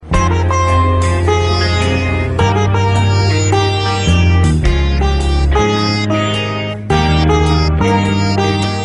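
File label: beautiful sitar music; alternative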